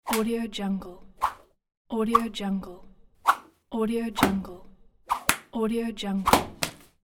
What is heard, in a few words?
lash